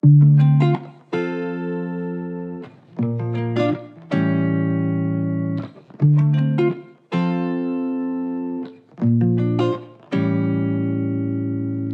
160 BPM D Min, Guitar; Dreaming; Cymatics; Miami Guitar Pack - Pt 1

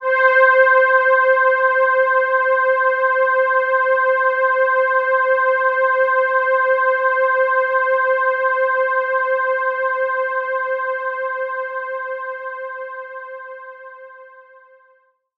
Jupiter-8 PAD Vintage - C; Synth; One Shots; Cymatics; Fantasy Synth Sample Pack

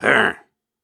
Snarls and Hums, Viking Vocal

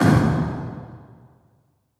Epic Drum; Psytrance; FX

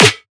snare oneshot; GhostHack

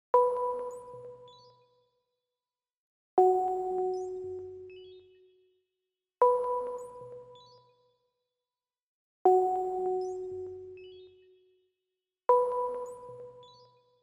158 BPM D# Min Sparkle Pluck; Loop Stems; Cymatics; Fill Up My Mind

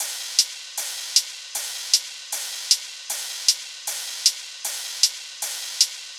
Deathstep Kit 155bpm Top, Drums; EDM Drums; Ghosthack; Drum Mega Bundle Demo; Ultimate EDM Drums; Deathstep Kit 20

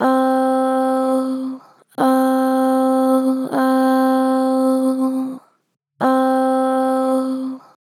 Ohh, Vocals, Pitch Flat Gentle; Harmor Vocals

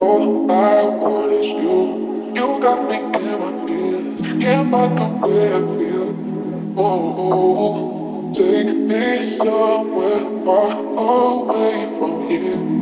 115 BPM C# Min, Melody; Take Me Somewhere; Cymatics; Infinity Melody Collection

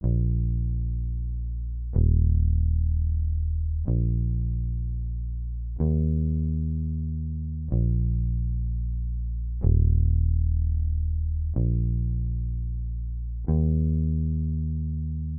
125 BPM A# Min Bass, melody loop stem; Trap; Loop Stems; Cymatics; 2022 Melody Collection - Trap Pt 2; Lament

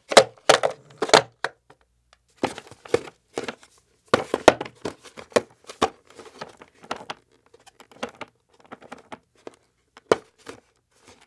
Skateboard Noise; Fairlight Sound Lib

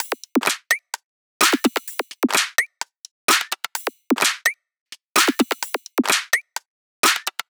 Perc Loop, 128 BPM; Drums; Loops; Top; House

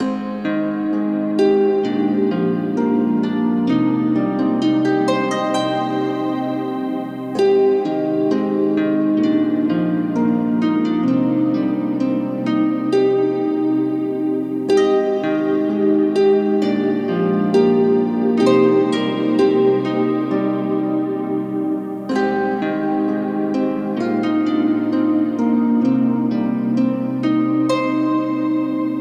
Harp Cm 65Bpm; Construction Kits; STEMS; Ghosthack; SkyRealm; Kit 02 - Solomon Hill

